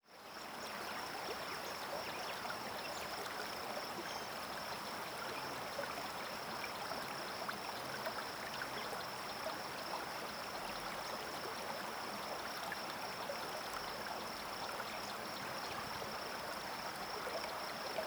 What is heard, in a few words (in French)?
Ambiance ruisseaux, Rivière calme; ambient